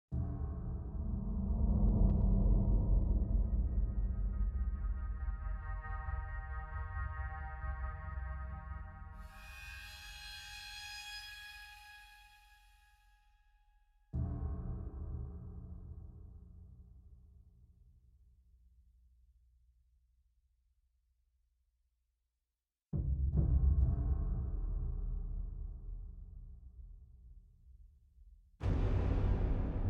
String; String Thing 3

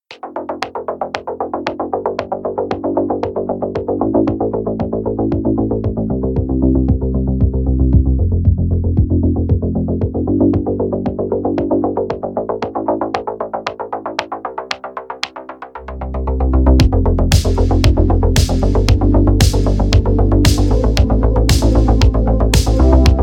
Waves; Nighthaw-X3000

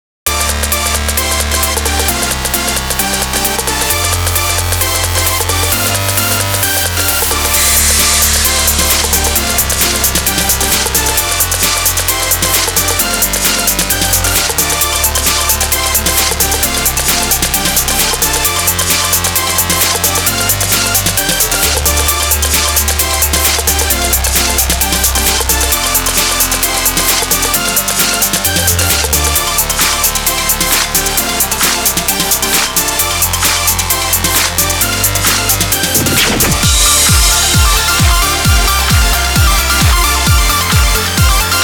Rainy Fantasy, Instrumental Version

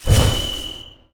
Explosion Large

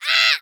VOICE Boy 6yo Scream mono; Infernal Radiation

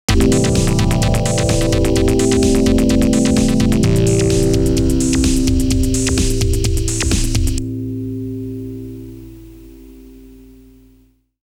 Game Start; R-Types; retro game music collection EX

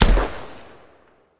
chopper shoot

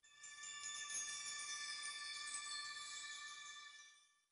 Chimes; Dragon Riders Chronicles of Pern; Dreamcast

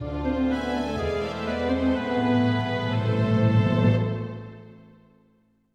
Event Sad; The Nightmare of Druaga Fushigi no Dungeon; PS2

